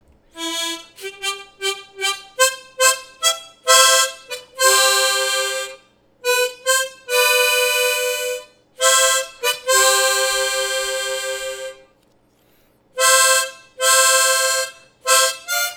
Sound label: harmonica